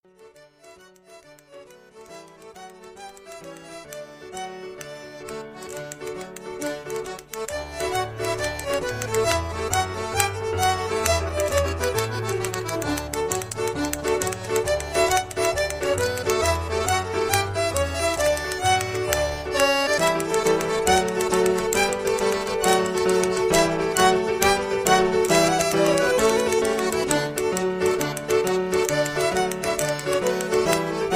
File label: hornpipes